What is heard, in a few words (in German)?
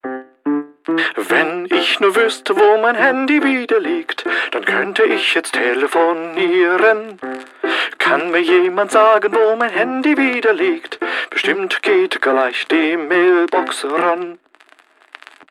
grammophon